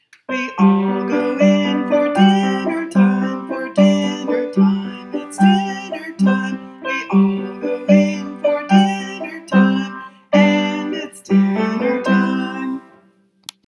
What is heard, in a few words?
Gram Singing Dinner Time